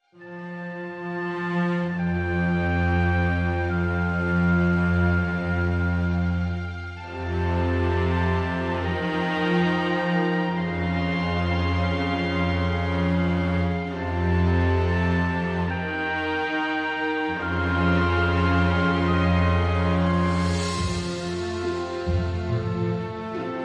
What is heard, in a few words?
Orchestral